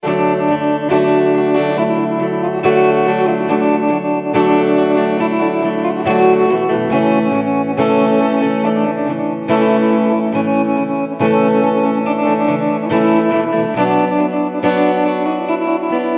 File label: Calm Strolling, No Drums